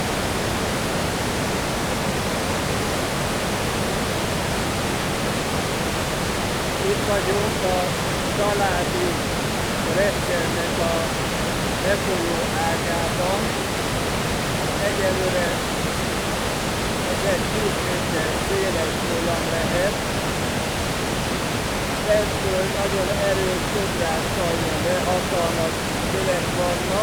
chaladi gleccserfolyo hangos hangjelzes

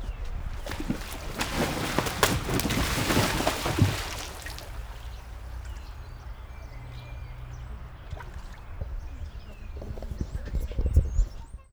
vizes felvétel, pelikán; állathangok; nyíregyházi zoo 2010

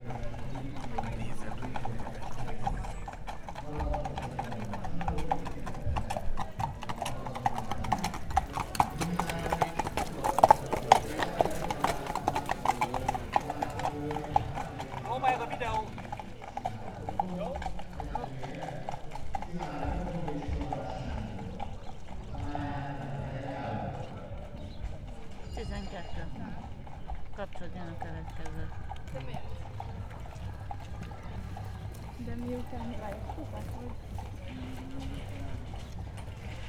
fogat jobbról és balról versenypálya közelében, ló; Hortobágyi Nemzeti Park 2013; máta

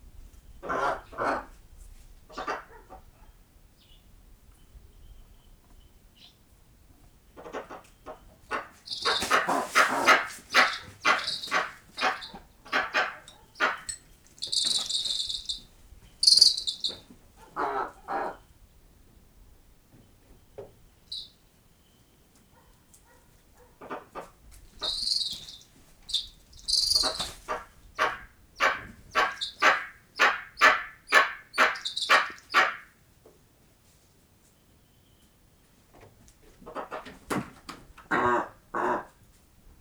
veszekedő madarak, parlagi sas; allathangok; termeszetben; gores tanya

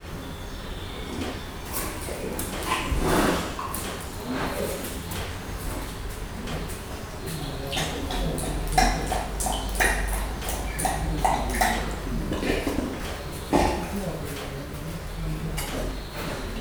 rejtett mikrofon, iszik, leopárd; minusz 45 os felvétel; jászberényi zoo

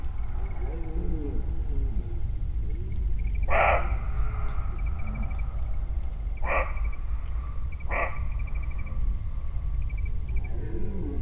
gimszarvas madar es tucsok; termeszetben

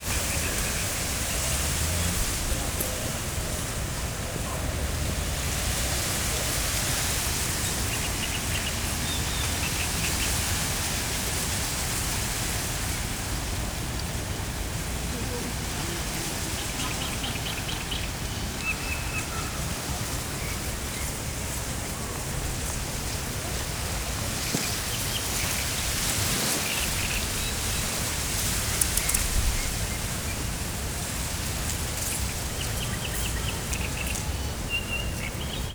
nádirigó susogó nádas; szegedi zoo 2011

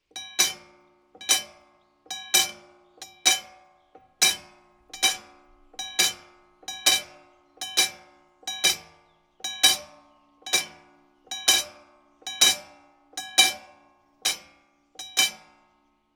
kézzel történő megszólaltatás, hangjelzésű sorompó; vasut; vizhaz; cardioid

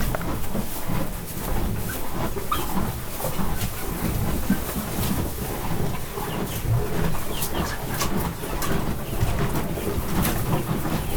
tehén istállóban szénát tesznek csirkék, magyar tarka szarvasmarha; parasztudvar

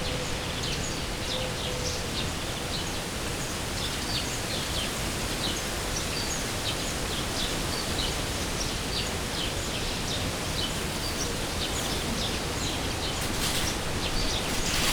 belso vegallomason varakozva, halastavi kisvasut, szel szabadban

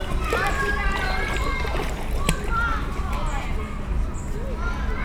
vízben tocsog, törpe víziló; szegedi zoo 2011

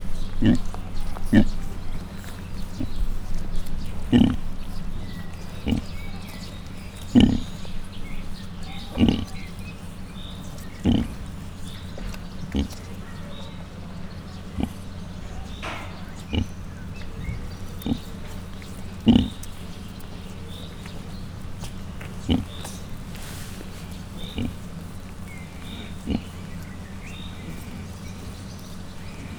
folytatodik a szoptatas, mangalica; miskolci zoo 2018

